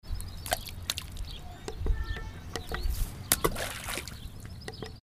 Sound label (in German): stein faellt ins waser